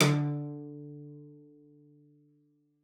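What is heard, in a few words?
D3, cello spiccato; Xsample VOL-5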